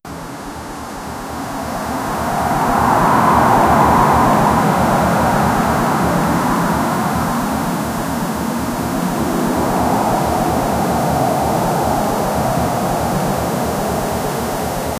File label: view of mountains desert, landscape